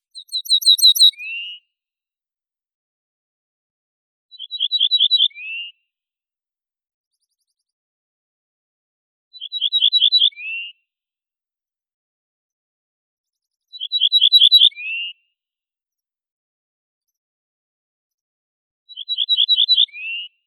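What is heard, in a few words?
ortolan bunting Emberiza hortulana, nature sounds; ovsyanka sadovaya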